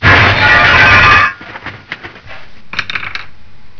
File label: die